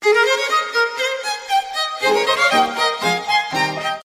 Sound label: Sms Violin